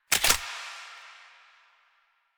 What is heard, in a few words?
Pistol Reload Mag Out; Weapons; DE; Warframe; Tenno; Lasrian